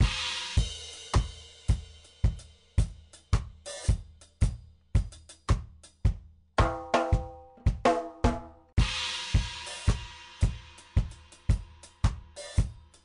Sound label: Reggae Drum; MusicDelta; MDBDrumsPlusPlus